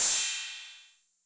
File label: Cym A 808 Sat Decay A, Cymbal, Multi Saturated